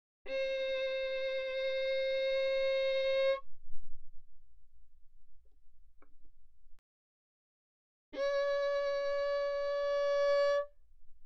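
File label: Violin arco mezzo forte sul G C5 F5; Strings; violin2012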